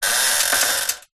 household bed bunk metal framed movement creak loose single; zapsplat